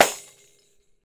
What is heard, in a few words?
pandeiro, steel percussion; E-MU Producer Series Vol. 7 – Old World Instruments